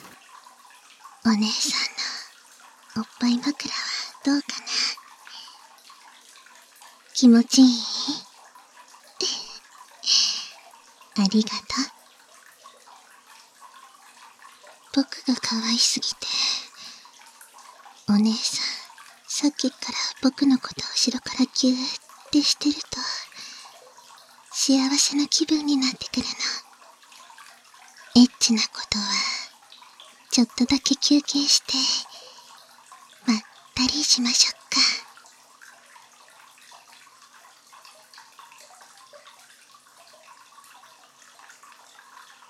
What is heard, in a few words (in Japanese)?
一緒に お風呂, 効果音あり 大きめ